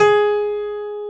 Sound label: G#3 STR PIA, G.PIANO; AKAI S-Series CD-ROM Sound Library VOL-7